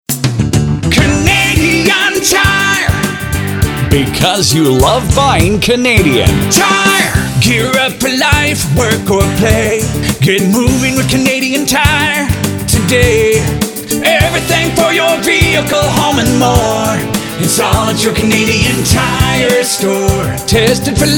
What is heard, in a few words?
brake shop jingles; Canadian Tire